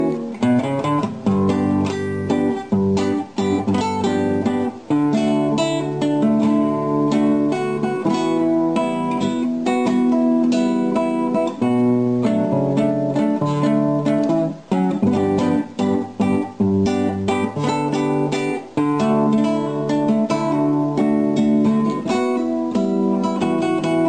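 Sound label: guitar ringtone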